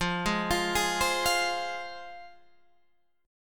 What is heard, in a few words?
F Minor F down Guitar Open G